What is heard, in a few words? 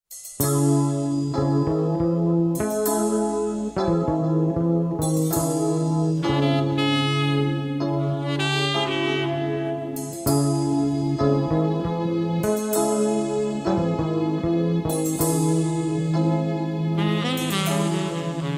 Tar Baby, yamaha dx7